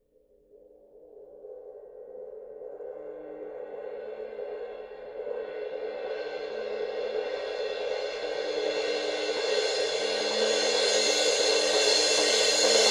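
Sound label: suspended cymbal roll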